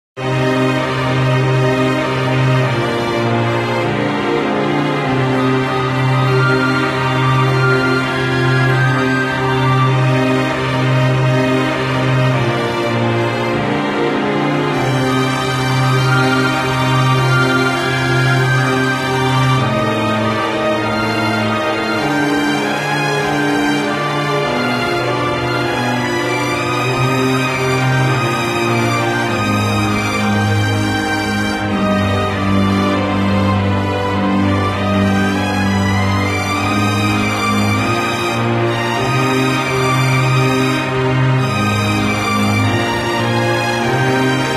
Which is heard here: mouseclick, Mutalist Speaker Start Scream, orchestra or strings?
orchestra